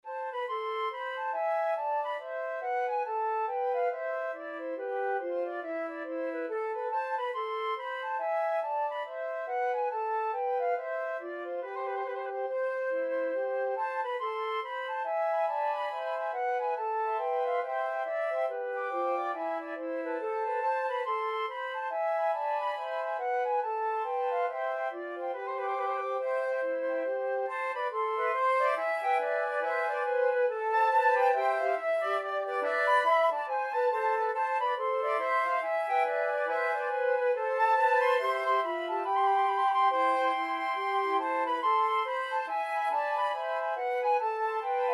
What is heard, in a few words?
flute quartet